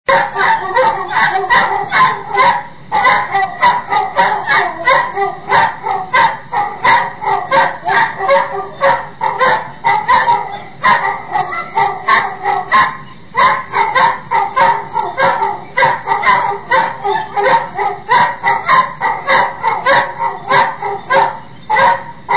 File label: singing